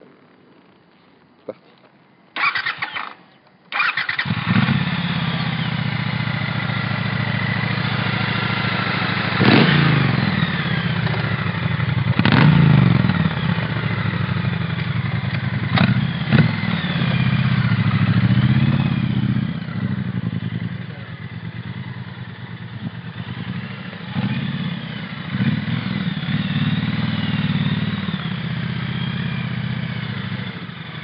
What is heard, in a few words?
Triumph T120; black